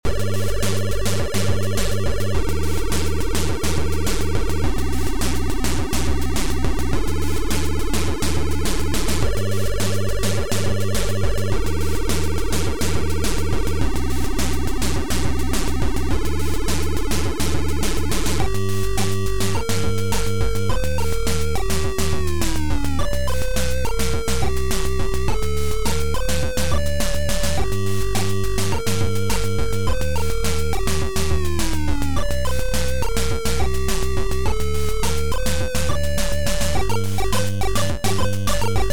Slow transe

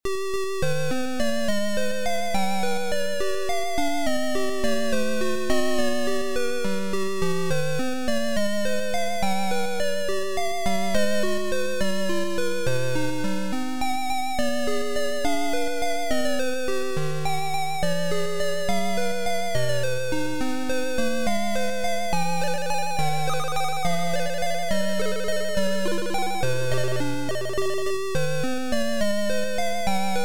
Guitar piece; George

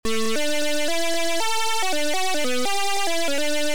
Title Beeper; Crime Busters

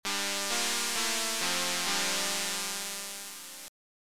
Transfer Beeper; The Sentinel

